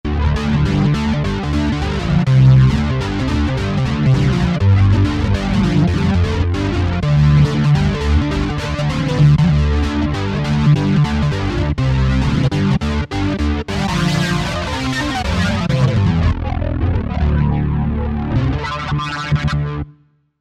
Grungitar, Roland V-Synth; Factory Patches

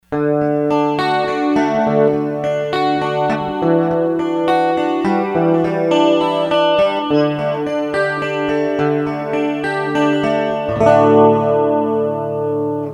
Arpeggio; Sony HR-GP5

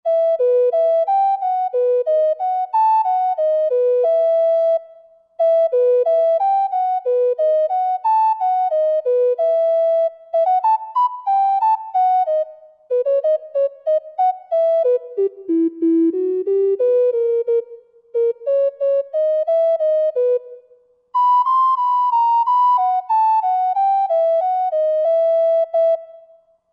Ocarina, Roland JV-2080

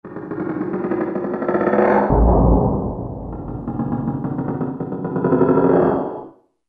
Percussion; OilTheDoor

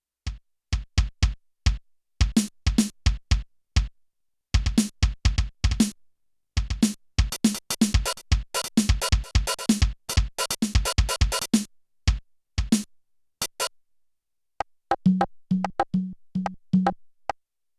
Jazz Drums; Yamaha TX16W; Jazz Performance

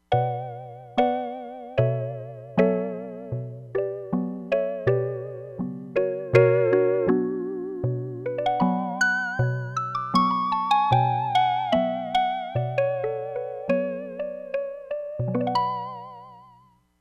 CALIMBA SYNTH PIANO, Crumar Bit 99; Factory Programs

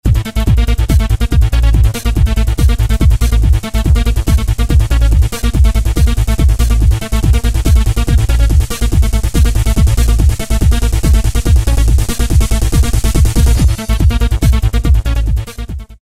Goa Trance Break Pattern, Roland MC-303

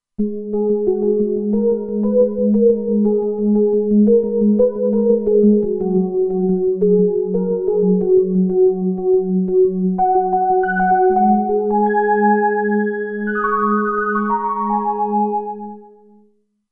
Seq, Roland Alpha Juno-2; Factory 2

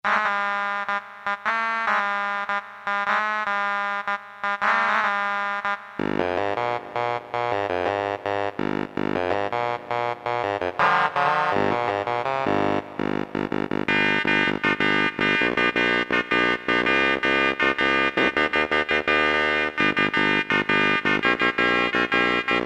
synth guitar; Tones

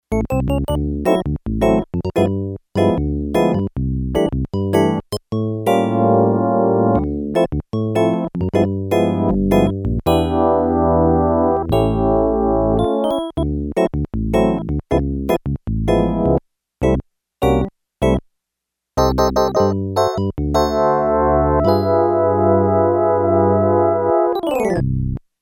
Touch Organ; Yamaha TX802; Factory Patches; Voices

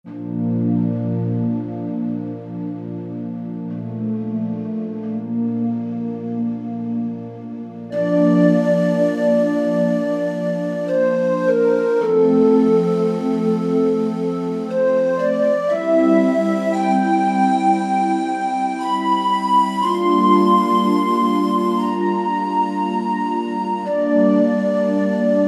Slo SynthOrch, Kurzweil K2000; Kurzweil K2000 Contemporary ROM

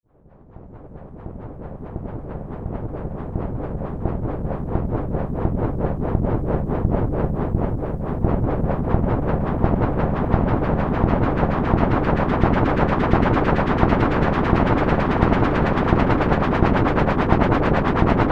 Steam Engine, Prophet-5; Sequential Circuits Prophet-5 (Ver.3); Factory Patch; File 3 Programs

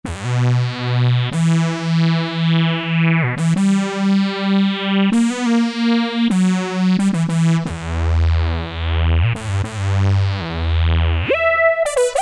Eazy, Yamaha TG77; Factory Presets

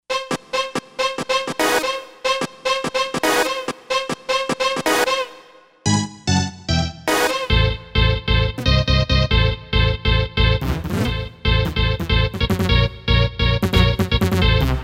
Techno Hit; Roland SC-880; Factory Patches

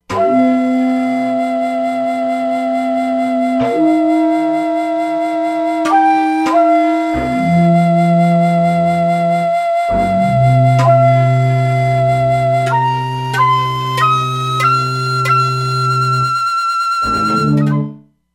Gamelan Flute, E-mu Proteus-3; Factory patches

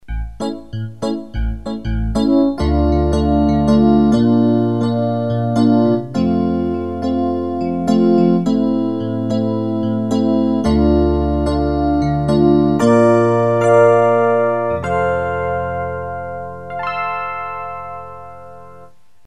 El. Piano; Generalmusic pRP8; Preset demos

